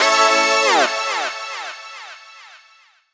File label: dubstep club stab